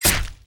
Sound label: ultimate attack impact; minsc; Idle Champions of the Forgotten Realms